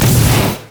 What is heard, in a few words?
overdrive; LoL; blitzcrank iblitz